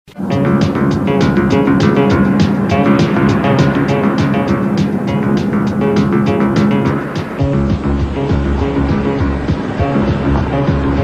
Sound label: police theme